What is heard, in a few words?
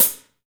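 hi-hat; Best Service Gigapack 2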